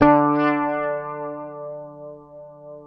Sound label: FINGERED, Bass; Sampleheads; Will Lee Bass Library